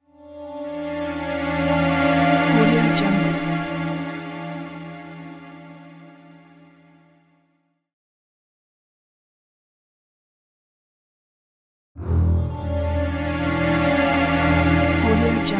uneasy transition

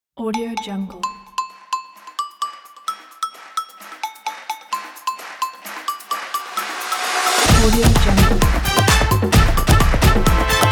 football soccer intro

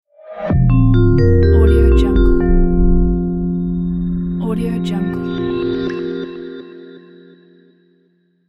soft logo

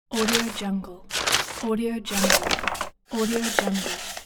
turning book page

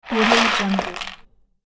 toy plastic building bricks

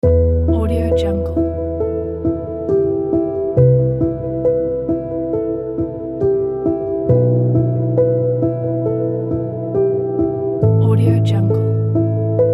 sad piano

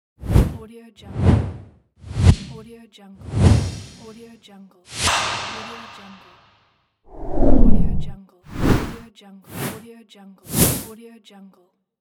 swoosh

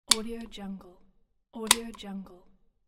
light switch on off

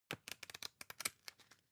object paper magazine browse

